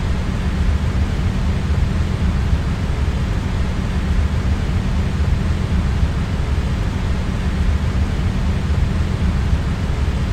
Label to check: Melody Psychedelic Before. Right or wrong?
Wrong